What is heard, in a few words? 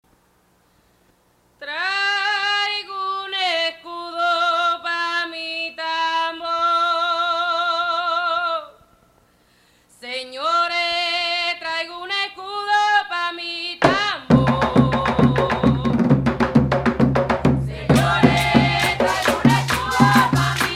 techno